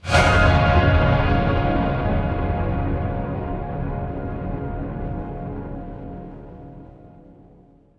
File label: horror stinger